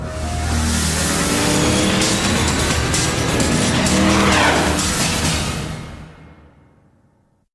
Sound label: vehicons attack